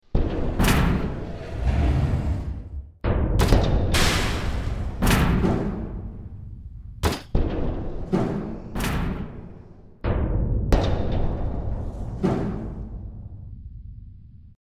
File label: Garage Door Slammings